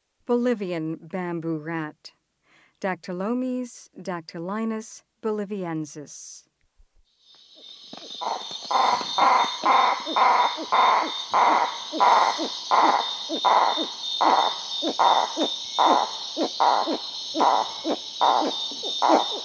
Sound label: Bolivian Bamboo Rat, Mammals of the Rainforest; S. America